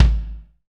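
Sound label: bass drum, BD Real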